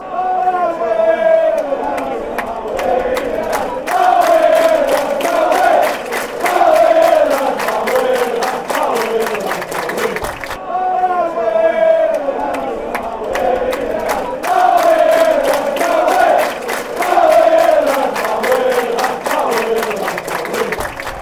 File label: haway lads, fanchants; newc